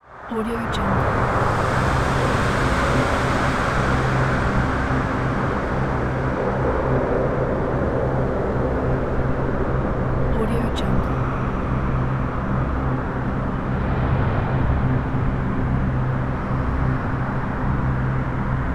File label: apocalypse atmosphere